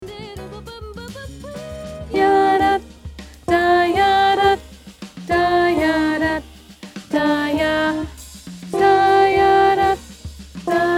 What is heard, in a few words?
alto, vocal jazz honor choir; SCVA Vocal Jazz Contemporary A Cappella Honor Choir